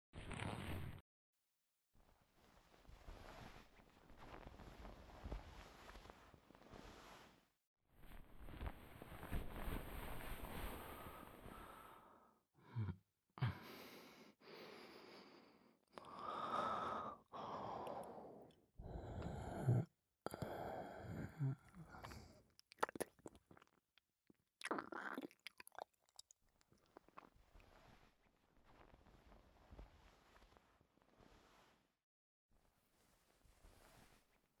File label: Ear lick & Finger